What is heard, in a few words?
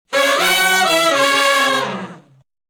Shuffle Blues Riff